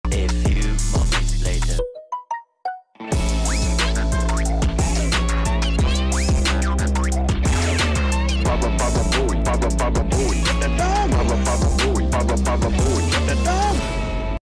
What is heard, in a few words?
phonk